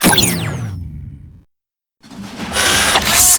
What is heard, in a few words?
transform full; clonco